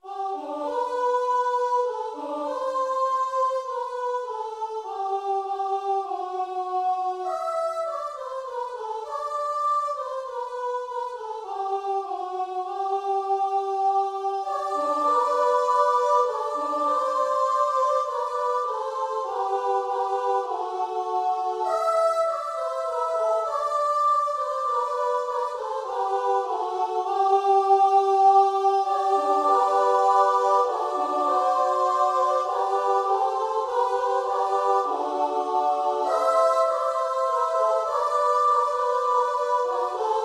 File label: choir